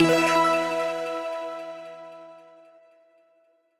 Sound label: Quest Complete; Super Mombo Quest